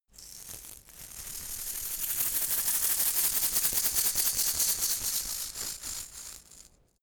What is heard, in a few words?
insect shaker rattle dynamic movement